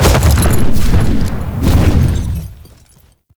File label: Horse Glide Start; Warframe; Duviri Horse; Vehicles